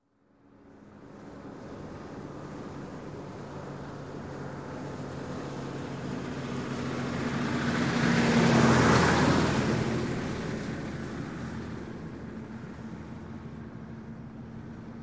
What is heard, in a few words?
Diesel Truck, Pass By, Slow; Sound Ideas 2000 Series